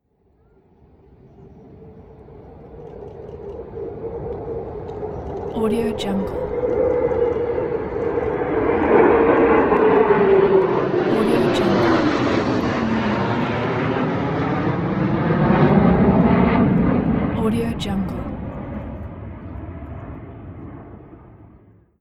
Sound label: squadron of military fighter jets flying over